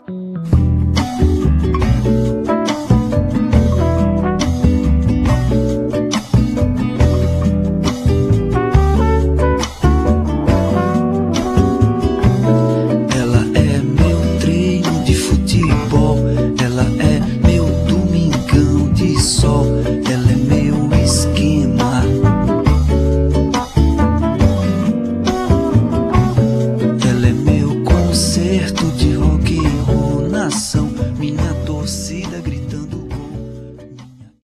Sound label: brazilian